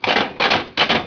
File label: slot; Microsoft Graphics Studio; Home Publishing 99